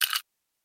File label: Percs